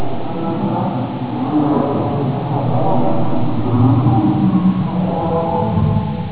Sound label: amb scary